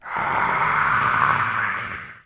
Cultist Player Caught

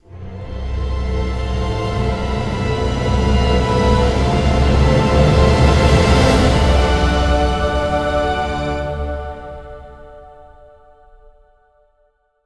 Rune Victory

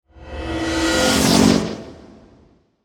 barrier loop

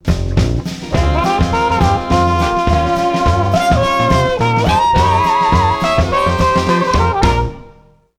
Jazz Club Jam; voodoo vince unofficial complete soundtrack; windows xbox xbox one

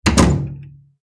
Door close

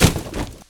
Cardboard Box Breaks

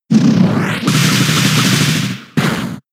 Power Whip; Pokemon; Gen 6; XY ORAS

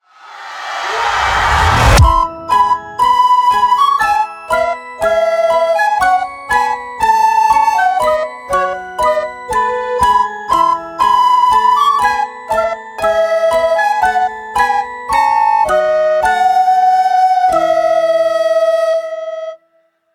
PVP Lose

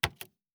SFX Typewriter Click; this is the zodiac speaking 2020 gamerip switch ps4 xbox one pc macos

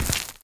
warrior walk stone

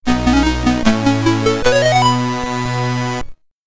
Stage Clear!, SID Stereo; cobra; commodore 64

